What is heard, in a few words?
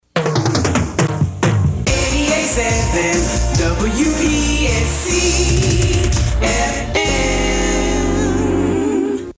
JINGLE Slow